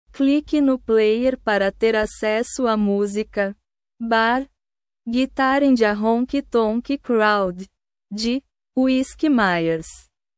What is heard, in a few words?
bar guitar and a honky tonk crowd